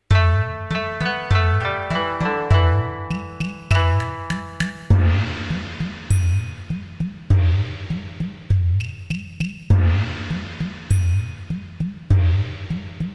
Chinese Gong Song; Meespeel CD

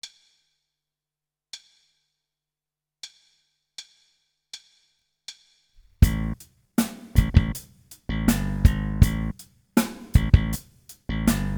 Grooves

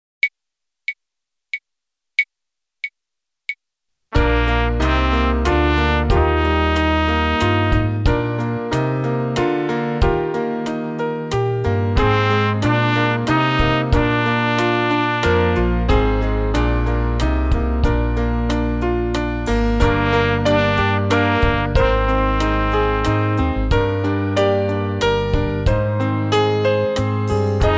Echo Exercise

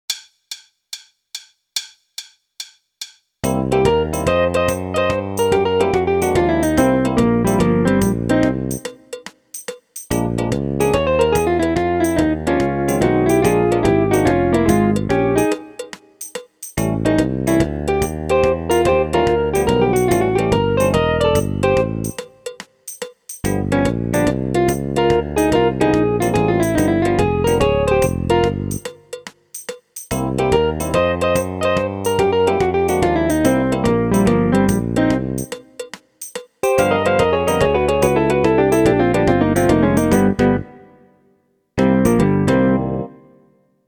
Shuffle; Meespeel CD

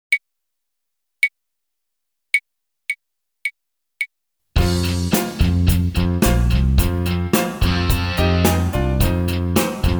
Staccato Blues

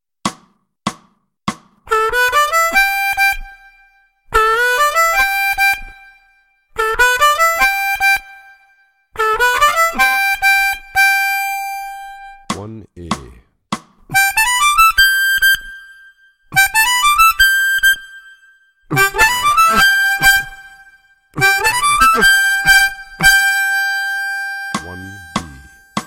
Authentic Blues Licks

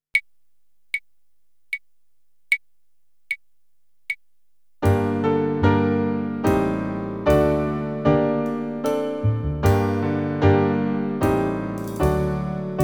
Polska

